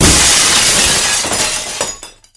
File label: smash big window